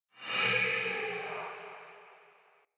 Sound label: Callstone Transmission Out; Transmission In Outs